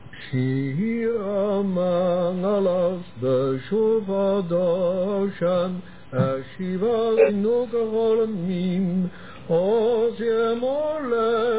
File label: old Dutch; shir hama'lot; Elzas